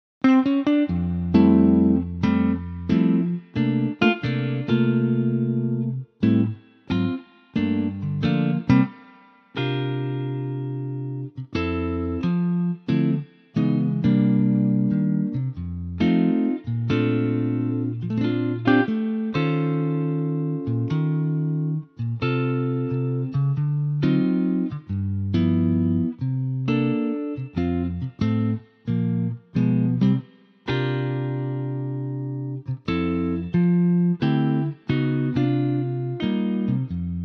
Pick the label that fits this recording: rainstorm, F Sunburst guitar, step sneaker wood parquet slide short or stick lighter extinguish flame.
F Sunburst guitar